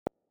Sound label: MI 528 Hz; Transformation and Miracles DNA Repair